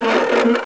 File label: attack; sven; aomdc; monsters; screamer